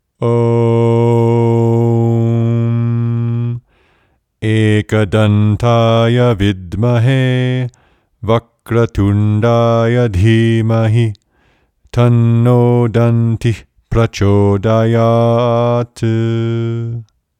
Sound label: Ekadantaya Chant